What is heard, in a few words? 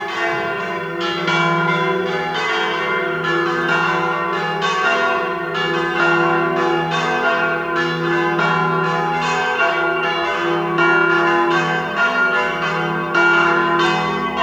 Florence bells of Duomo